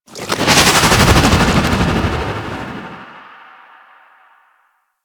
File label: Infested Juggernaut Charge Whoosh; Warframe; Attacks